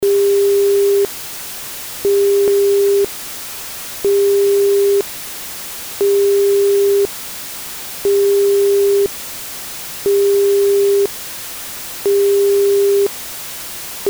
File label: Noise Tone